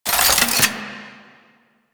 Fulmin Prime Primary Switch, Weapons; Warframe

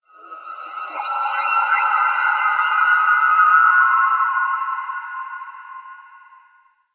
Exterior Organic Drones, Ambience; Warframe; Railjack

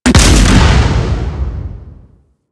Bomb Explosion; Missions; Raid; Grn Bomb Objective

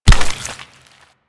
Infested Crawl; Warframe; Crawling

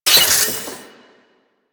Moa Fabricator Spawn Activate; Warframe; Quests; The New War; Veso

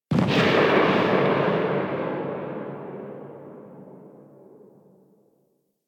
Tail, Weapons; Strun Vandal

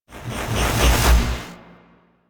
Transmission In Head Out; Transmissions In Your Head

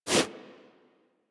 Wall Jump Foley; Footsteps; Warframe; Tenno; Wall Run

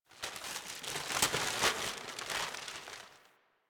Paper Blowing B; Warframe; Ambience; Grineer; Carnival

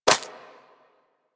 Bird Of Prey Step Dirt; Warframe; Footsteps

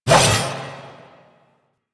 Two Handed Nikana Swing; Warframe; Duviri; Duviri Dax; Weapons

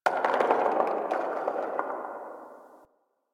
Landscape Rock Slide Low, Ambience; Warframe; Venus Open World; One Offs

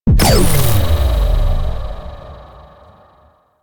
Overcharged Activate; Enemies; Abilities; Arachnoid Wraith; Warframe; Corpus

